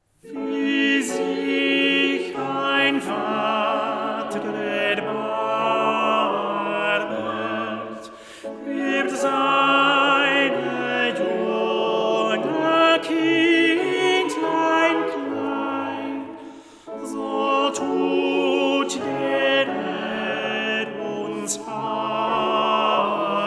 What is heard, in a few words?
Tenor; BWV 17